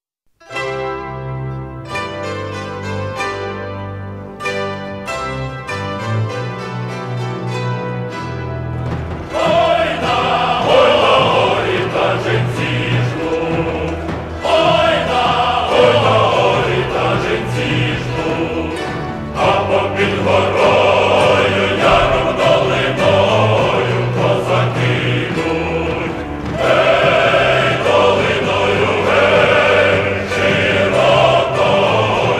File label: ukrainian cossack song; oy na gor ta y jenc jnut